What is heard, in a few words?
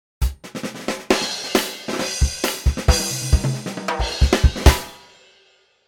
motown drum kit; rock and pop